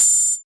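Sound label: Cymbals open hi-hat; Vengeance Samples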